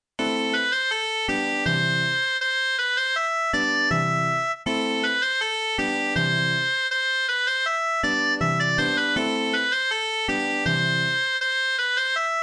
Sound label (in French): hanter dro